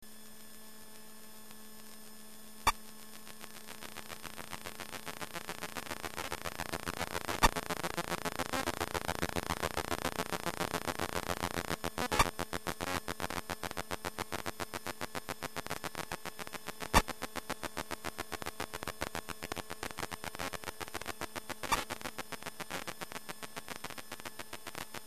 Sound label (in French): RADAR a survol